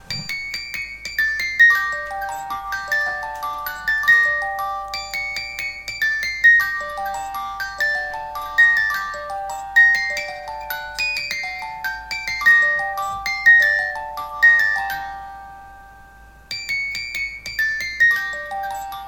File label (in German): Spieluhr; Fur Elise